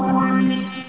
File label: booriingg